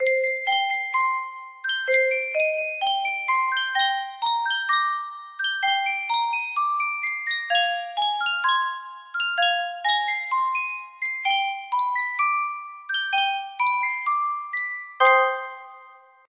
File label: yunsheng spieluhr, music box melody